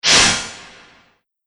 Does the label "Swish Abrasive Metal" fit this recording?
Yes